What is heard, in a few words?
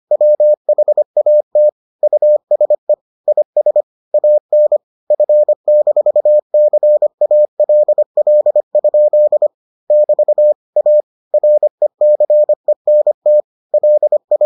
morse